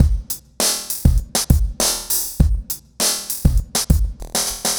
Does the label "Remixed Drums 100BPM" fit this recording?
Yes